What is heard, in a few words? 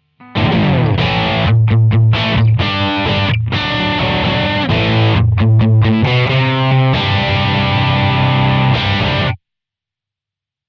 amp AC combo power amp dist; Simlpifier